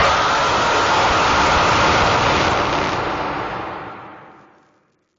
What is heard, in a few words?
jumpscare; oblitus casa toons